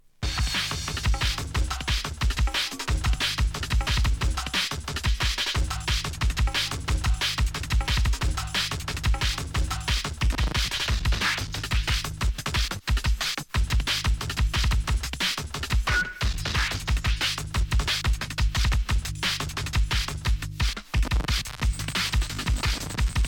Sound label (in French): mix hardcore lent